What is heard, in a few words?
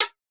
HiHat, Psytrance; Drum Shots